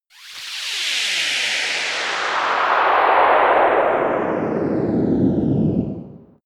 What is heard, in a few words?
space passing rocket; raumschiff